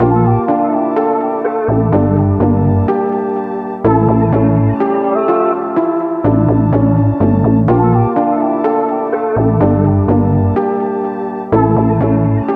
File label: 125 BPM D Min, Melodies Analog; Blue Dream; Cymatics; ZODIAC Beta Pack